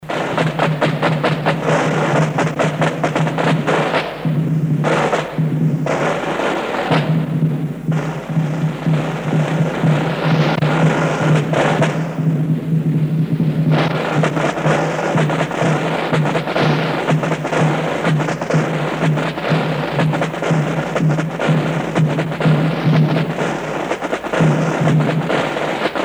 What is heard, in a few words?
QuickStep